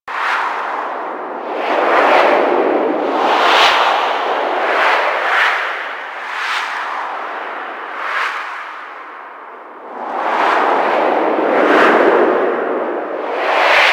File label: Moon Wind Medium Loop; Warframe; Ambience; Orokin